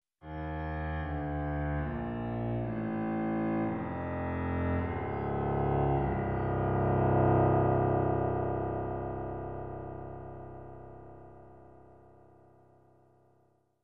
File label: Dark and Evil Piano